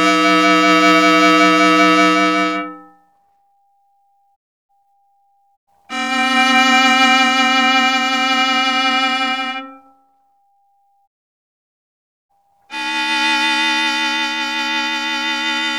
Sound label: robot violin